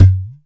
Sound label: ROLAND SPD-20 PERC TABLA; ETHNIC and WORLD PERCUSSION